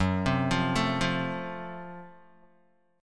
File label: Gb Minor Major 7th Flat 5th, Guitar, chord; arpeggiated; 2,3,3,2,5,x; JGuitar